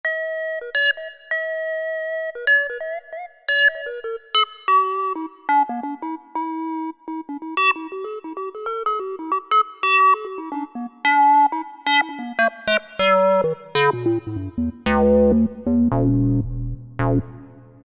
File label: Classy Pulse; Roland SR-JV80-11 Techno Collection